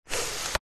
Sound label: technology camera dslr telescopic lens turn adjust slide nikon d5200